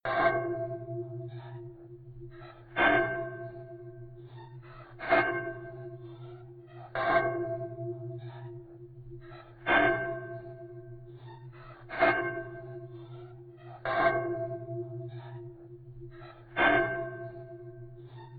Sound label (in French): Son sur un poteau